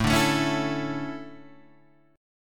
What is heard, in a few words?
D Major 7th Suspended 2nd